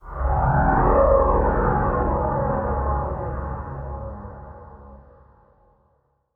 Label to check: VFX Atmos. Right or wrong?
Right